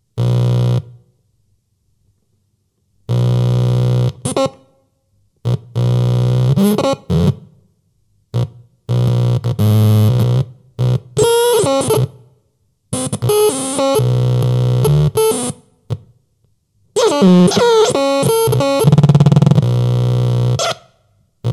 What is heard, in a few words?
feedbackphonium